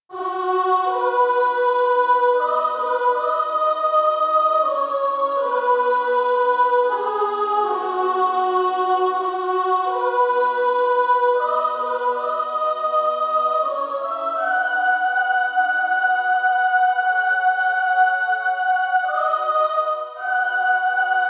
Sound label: Female Choir S1; Amazing Grace